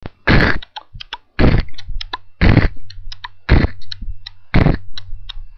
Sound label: baler sound